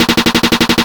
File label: Snare Trap Drum Roll 8 Count; Nova Sound